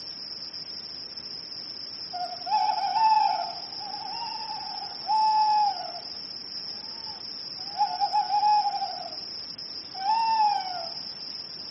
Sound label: Amb Countryside Night